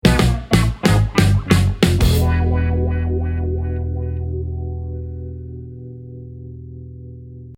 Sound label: Level lose; Deadbeat Heroes